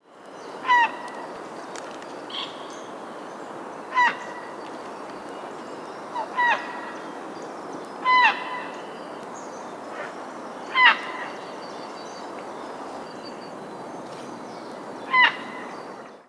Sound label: Ardea cinerea